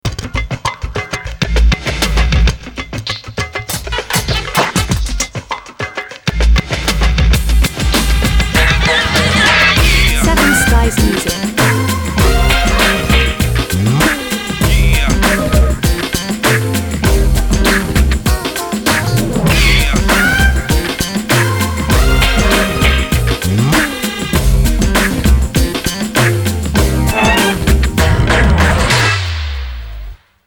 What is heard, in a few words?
Kids TV Theme